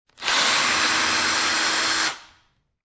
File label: Drill Electric Remove Screw